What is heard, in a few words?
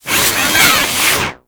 Arsenal Spin; Liset Machines; Tenno